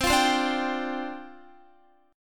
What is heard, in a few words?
C Suspended 2nd Suspended 4th, down, Guitar